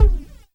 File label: Kick; Sonic Foundry; Esoterik Beatz; Quesy Kit